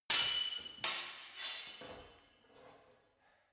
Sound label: sword hit sword single